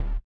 BUZZER; Spectrasonics; Metamorphosis

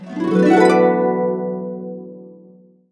D, Harps; USB Soundscan vol.62; AKAI

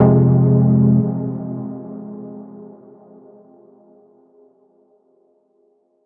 stab dubtech F, Noise; stabs

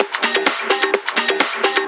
Latin Loop 128 BPM

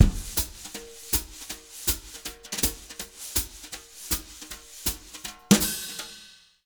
Jazz Latin Drumloops; 160 CHABAD; USB Soundscan vol.08; AKAI

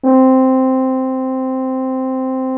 BRASS 2 C3, ORCH BRASS; Greytsounds VOL-1

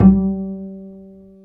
Bass, Roland

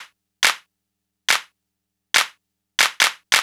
NRG Percussion, Trance

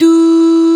OOOHS, VOX; SC55; East West - Global Sounds

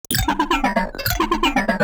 BASS SHOT

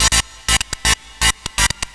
Synth Arp; Sonic Foundry (Sony Creative Software); Crimson Blue and Fabulous Horncraft 4 RnB; NYDanceII

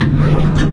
E ENGI, Anime Sound FX, ROBOTS

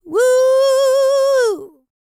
licks, vocal, dance; ILIO; Vocal Planet

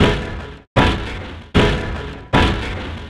Grind Env; Sonic Foundry (Sony Creative Software); Hydroponic Hip-Hop

